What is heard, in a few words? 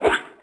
menu next